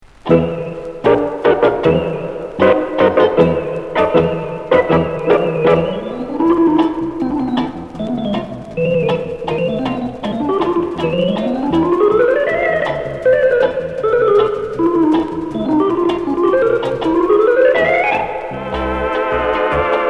orchestra

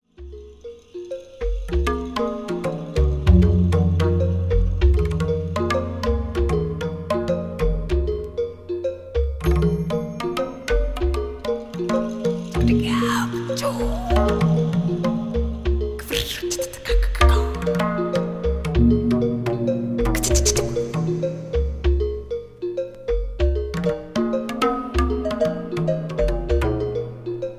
four drummers drumming

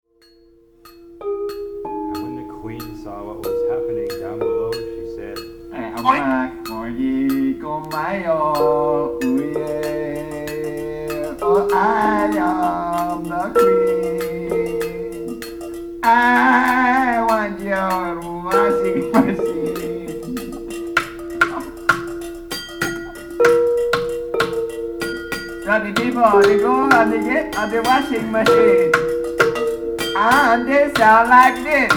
glass orchestra

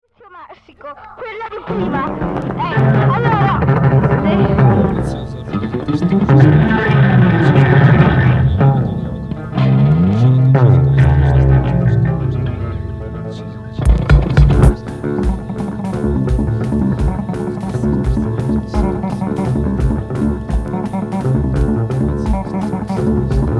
monofonic orchestra

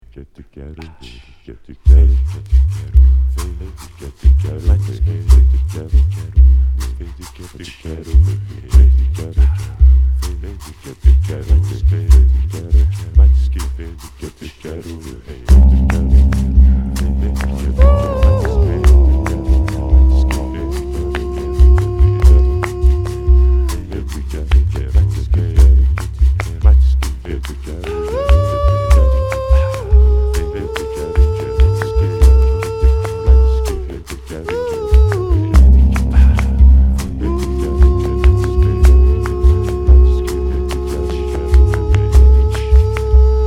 rava string band

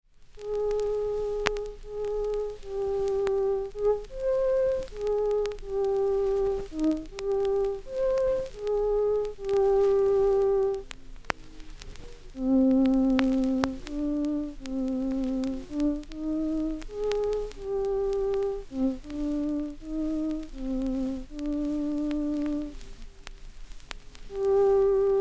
music by computers

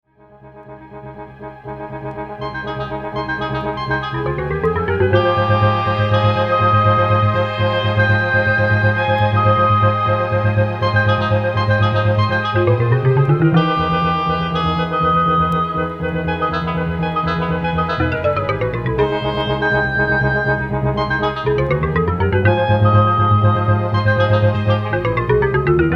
mega wave orchestra

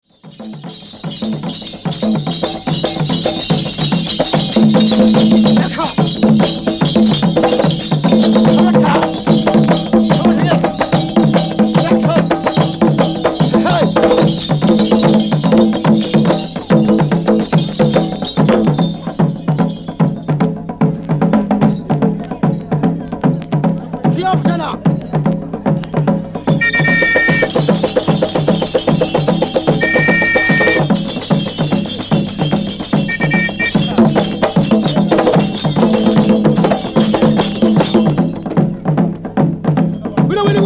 music of africa drum

